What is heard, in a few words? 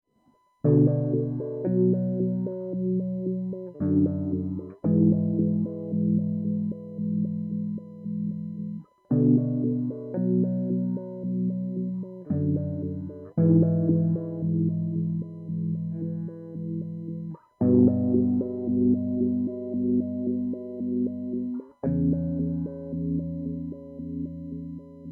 wah lfo